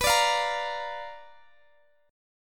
B-Minor Major 7th Flat 5th B down Guitar DADGAD

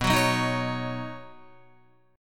B Major Add 9th, Badd9 chord, Guitar; strummed; JGuitar

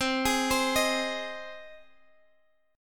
C Minor Sharp 5th C down Guitar Drop D

